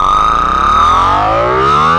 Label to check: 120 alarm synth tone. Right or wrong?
Right